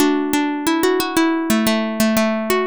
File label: plucked